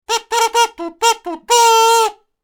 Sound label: party kazoo sound effect